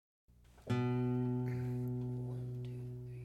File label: airplane